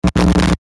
Sci Fi Electric Arc Single; Tech; Blastwave

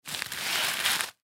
Paper Towel, Paper Towel Acrylic Handle Movement; Surgeon Surgery; Hospital; Emergency

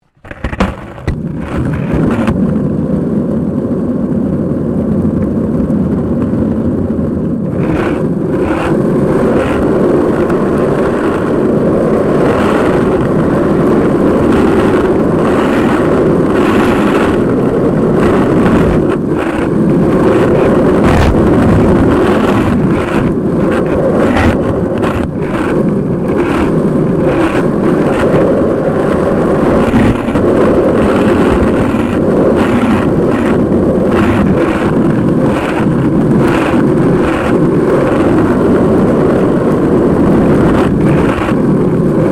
SKATEBOARD UNDERSIDE ASPHALT MEDIUM; Skateboard sounds; Skateboarding; Sports

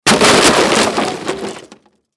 Crash Wood Smash Break; Crashes; Sound Effects:Objects; Blastwave

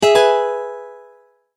multimedia tone correct

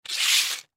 Plastic Package Scrape Cement; Plastic Large; Scrapes (Sound Effects:Objects); Foley; Blastwave

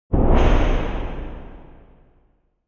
Whoosh Long Tail; Whooshing sound for video editing, movements, logos, flash, or animation; Whooshes

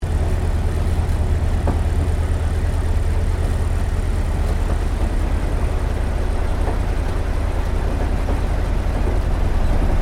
AIRCRAFT T-28D TROJAN TAKEOFF INTERIOR; Airplanes; Vehicles

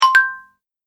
Correct Double Ding

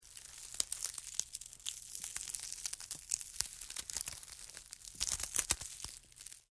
Wood Break Snap Crack Foley; Small; Materials; BBFX

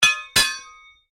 Strike Metal On Metal Heavy Ring Double; Foley sounds for melee weapons; Materials

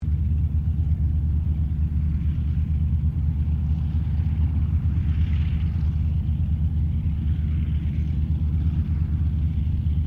AIRCRAFT CERES WINJEEL IDLE DISTANT; Mixed aircraft squadrons; Airplanes; Vehicles

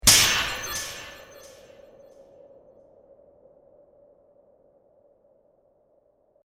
Demon Sword, sword sound effect; Stormbringer; fantasy

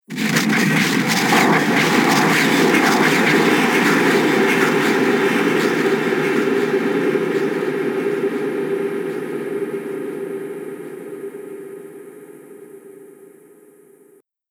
Explosive Fantasy Fx, magic sound effect; Andrew Fleming

